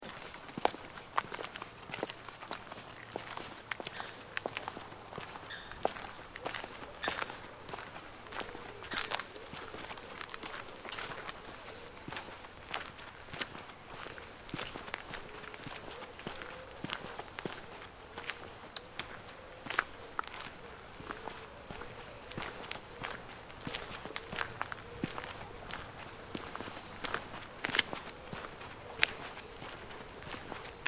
Walking In Parkland, Footsteps walking through tranquil parkland with water bird sounds.